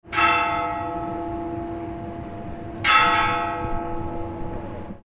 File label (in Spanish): campana